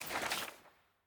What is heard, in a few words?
sfx ui record next click